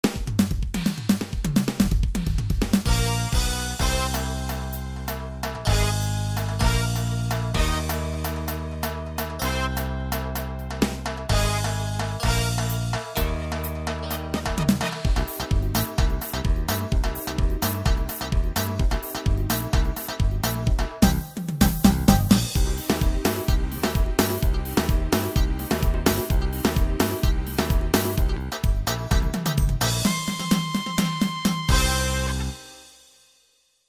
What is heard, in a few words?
Latin, Cm